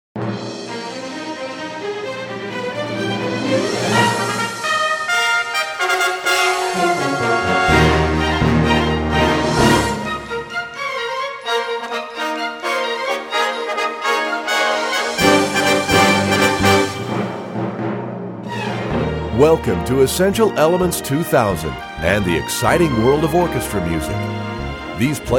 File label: tuning track